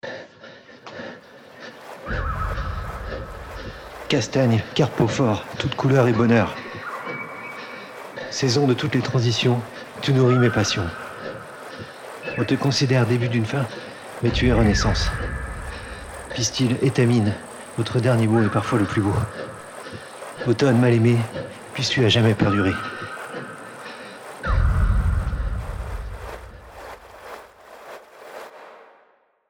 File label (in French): transition Automnale son